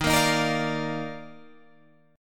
Eb Major 7th Suspended 2nd Eb Guitar Drop D

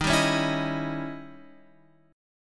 D sharp 7th Flat 9th Guitar Open F; D#7b9; strummed

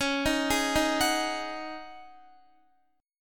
Db Suspended 2nd Suspended 4th Db Guitar; arpeggiated; x,16,13,13,15,13

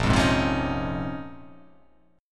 Bb Minor 11th, Bbm11 chord; strummed; JGuitar

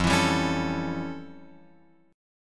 F Augmented Major 9th F Guitar Open F; F+M9; strummed

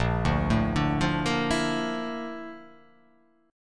A sharp Major Sharp 11th

